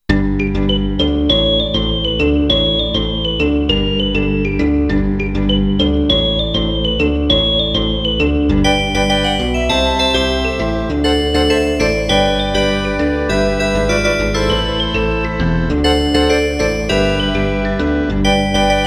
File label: samba; Прочтение нотного сборника "В ритме танца"